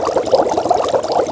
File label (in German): erlenmayer; Crazy Machines; Играет вся семья